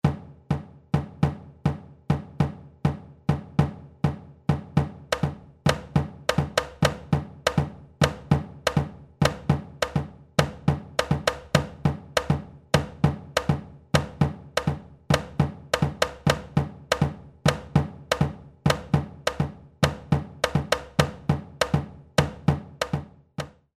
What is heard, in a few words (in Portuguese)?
batucada tamborim